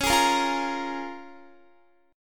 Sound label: Db 6th Db, down, Guitar; strummed